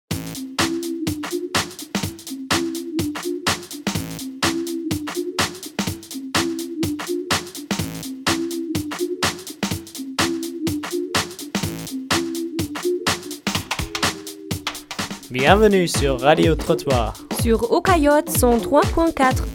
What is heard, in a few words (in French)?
generique radio trottoir